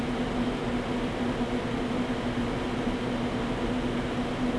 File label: ventilation Shaft; Star Wars Obi Wan; XBOX